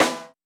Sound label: Snare; urban grooves; Rock